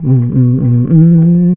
BASS MMM; USB Soundscan vol.18

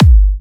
G, kicks; markus hakala kicks

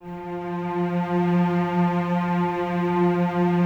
CES F 3, Cellos, String Ensembles; Miroslav Vitous; 90 s Sample CDs

